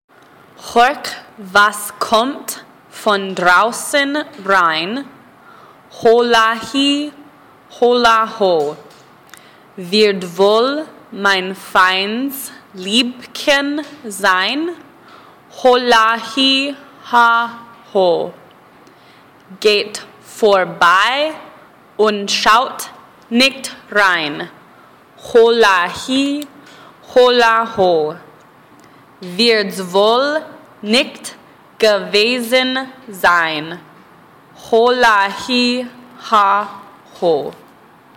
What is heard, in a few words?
german song